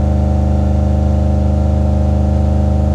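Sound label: Truck Cherokee Loop; SFX Kit; Trucks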